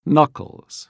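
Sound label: knuckles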